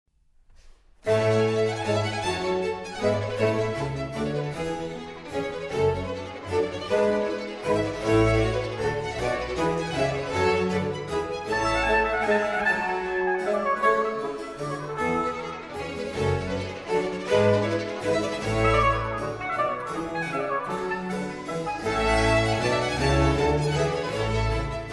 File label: Concerto in B flat major Allegro